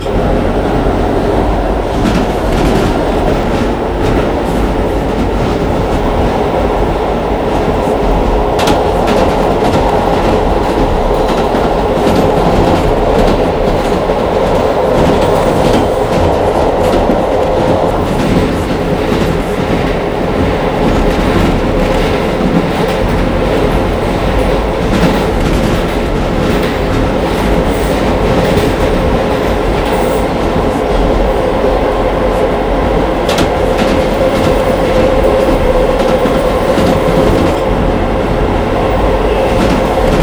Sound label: run, R142; OpenBVE; Jerome Yard